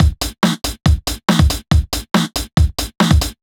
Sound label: Break Beats, 140 BPM